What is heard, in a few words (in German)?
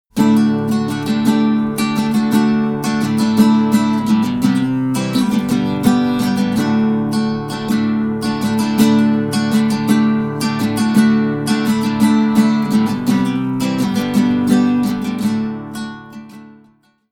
Nylongitarre mit Röde NT1